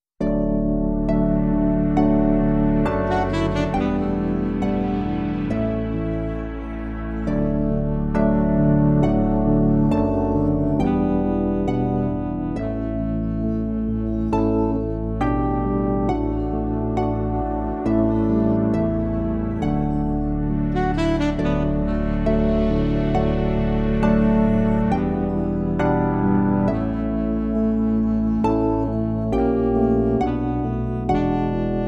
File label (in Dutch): Muziek van de slak